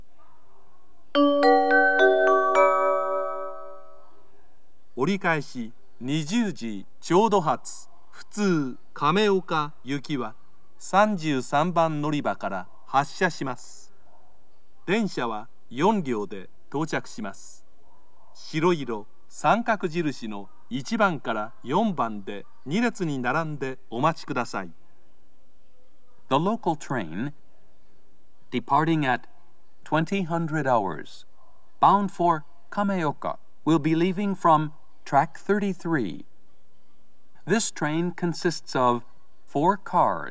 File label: turn local, kyoto station; kameoka